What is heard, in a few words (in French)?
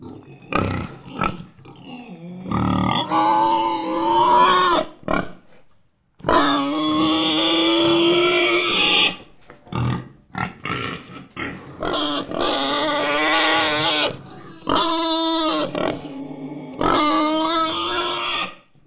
cochon